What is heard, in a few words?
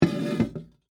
Pot Set Down, Household, On, Wood, Full, Small, Metal, Antique; Set Down Sound Effects; BlastWave